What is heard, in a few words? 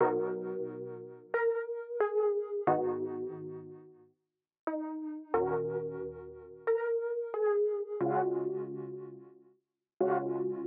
90 Dmin Plucks Dry